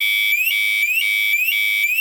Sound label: alarm